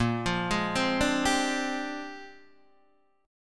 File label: A sharp 13th, Guitar DADGAD